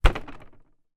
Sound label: impact wood headboard bed